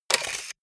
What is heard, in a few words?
camera